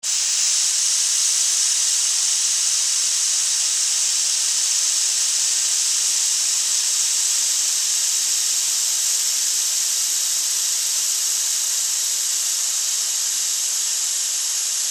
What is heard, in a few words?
Pesticide Fogger, Extermination, Pesticide, Fogger, Insect, Bug; Sound Effects:Animals:Insects; Blastwave